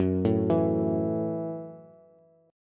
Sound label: Gb Minor 7th Gb down Bass; arpeggiated; JGuitar